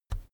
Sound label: keyboard external key push soft